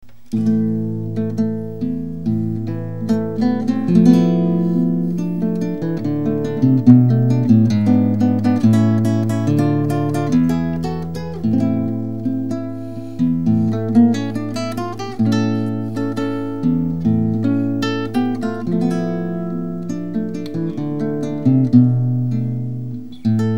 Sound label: classical guitar